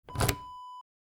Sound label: car door key remove